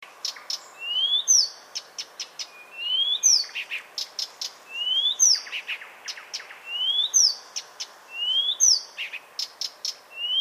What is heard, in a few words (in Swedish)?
Busksangare